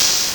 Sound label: LSDj TR808, Nintendo Gameboy